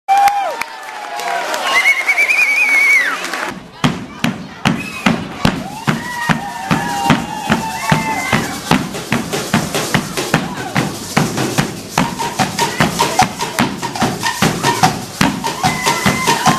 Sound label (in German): Duell (Rhythmus)